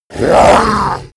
orc attack

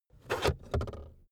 car backseat table pull out cupholder heavy

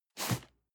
cardboard box medium slide short hard; objekte

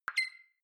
click whistle up high, signale

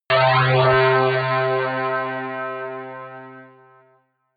synth hit muffled drop high

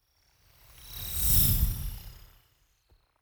fly by insect drone long; swooshes-swishes

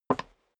step dress boots wood creak single left; schritte; Footsteps; menschen

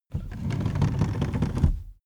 car seat slide backward slow

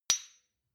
shot glass clink medium, gastronomie; berufe; soundpool

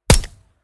gun shot silencer designed realistic; schusswaffen

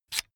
electric drill template adjust heavy in

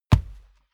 step barefoot wood plank single trample; schritte; Footsteps

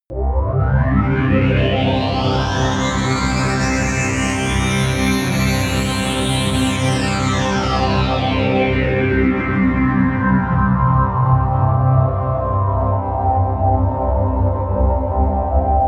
pad sweep underwater; flaechen

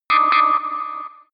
danger double

